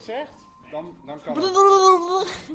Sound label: blblblbl